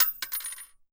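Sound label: bullet case bounce 308 glass; gmod; viper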